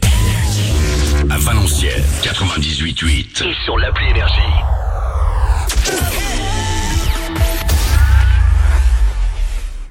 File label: JINGLE; VALANCIENNES, 98.8 FM; NRJ